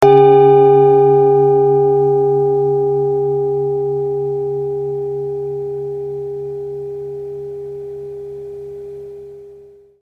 Pluto-Klangschale Ø 15,1 cm; Planetenschale; Altes loslassen; 500-600 Gramm; Sound-Spirit Shop

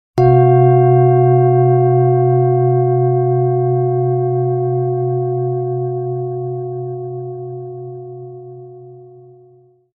Jupiter-Planetenschale antik Ø 18,7 cm; 500-600 Gramm inkl. Klöppel; Sound-Spirit Shop

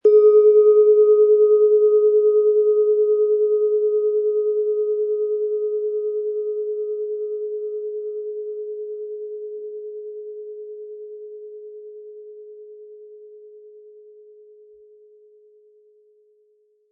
Planetenschale Neptun, Ø 12,9 cm, 320-400 Gramm inkl. Klöppel; Eros

